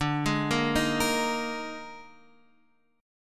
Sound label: D sharp Major 7th Suspended 4th, D